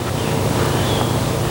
Whole Lotta Noise; Sound FX

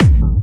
Harder, kicks

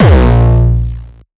kick

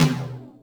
Yamaha RM 50 Toms

